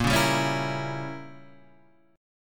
A sharp Diminished 7th A sharp; A#dim7 Chord {6 4 5 6 5 6} strummed

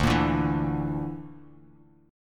D Suspended 2nd Sharp 5th, Bass Standard, chord; Dsus2#5; strummed; JGuitar

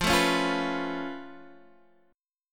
Db Augmented 7th F

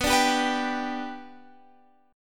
B-Minor Sharp 5th; strummed

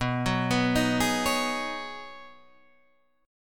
B 6th Add 9th B Guitar Open D, chord

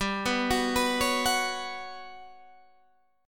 G Major Sharp 11th G down, chord; Listen to GM#11 arpeggiated

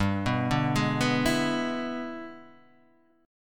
E 7th G, guitar; E7/G arpeggiated; JGuitar

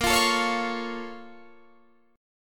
Bb Minor Major 7th, Guitar, Standard; BbmM7; strummed; 18,20,19,18,18,21; JGuitar

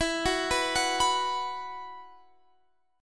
E Suspended 2nd E, Esus2 chord; arpeggiated; x,19,16,16,19,19

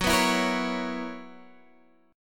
B Major 7th Suspended 2nd Suspended 4th Gb

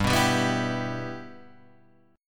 G 6th

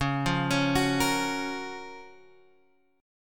F sharp Major 7th, C sharp

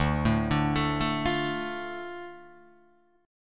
D Minor D Guitar DADGAD

